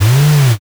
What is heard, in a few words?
servo